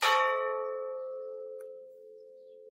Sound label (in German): glocke; gunzenbach